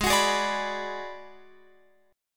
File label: G sharp Minor 7th Flat 5th G sharp down Guitar Open F; G#m7b5 strummed